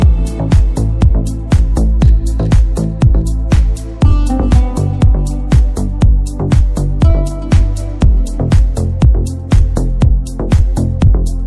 Turkey Bass Ringtone

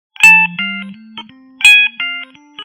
LG Velvet Sms Ringtone

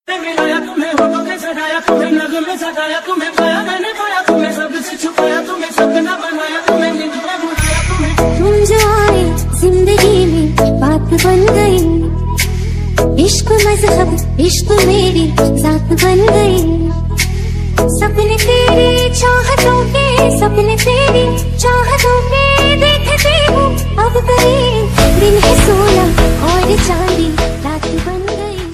Remix Bollywood Ringtone

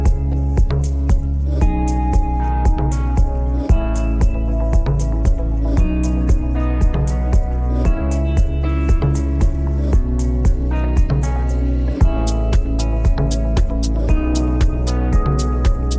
Motorola P30 Play Ringtone

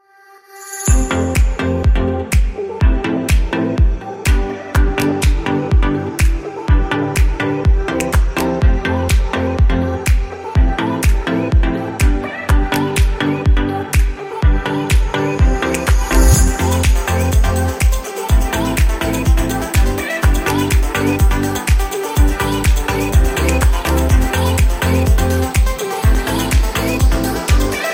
Huawei Y9 Ringtone

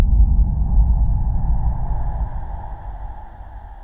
S9 Ringtone Samsung

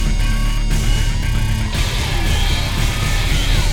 Tune Orchestral; Huawei

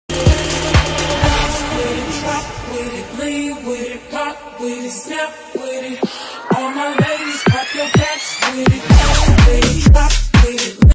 Tik Tok Song Tone

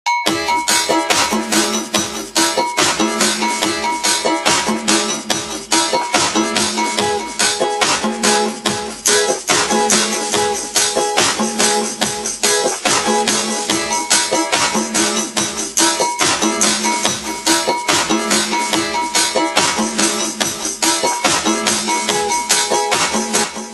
Original vivo Ringtone

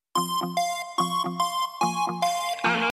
Notification Ringtone; Samsung Galaxy S23 FE

